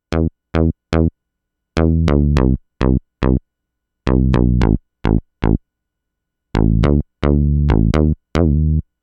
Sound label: Thin Bass Juno6